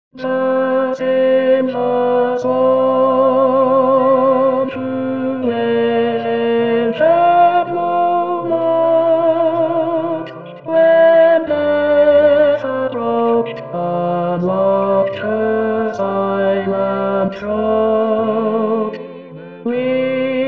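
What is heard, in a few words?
Silver Swan Tenor 2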